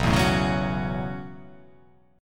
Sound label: B 7th Suspended 2nd Suspended 4th B down